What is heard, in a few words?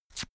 notepad sheet tear up fast soft